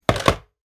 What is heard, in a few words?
phone hang up